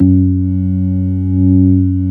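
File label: ORG B3 F#2, organ; Best Service Gigapack 2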